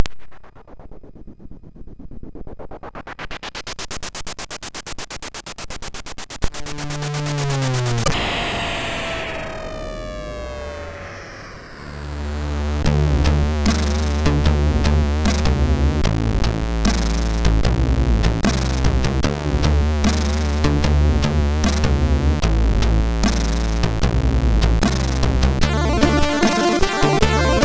Military, MOS6581R2; Deek

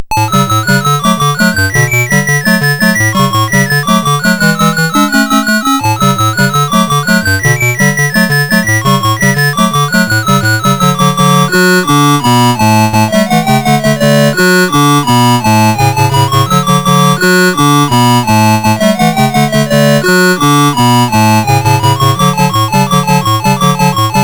BASIC sid CSG8580R5

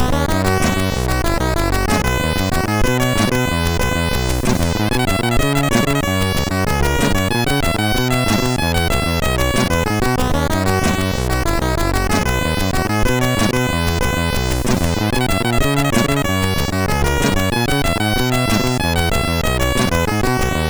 MOS6581R3

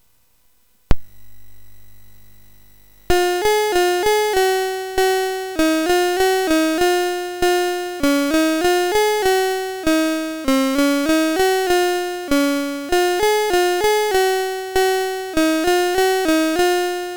sid MOS6581R4; Bal w Przedszkolu

